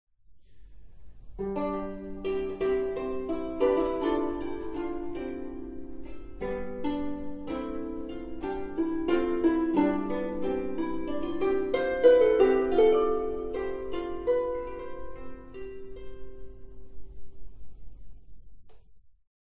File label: harp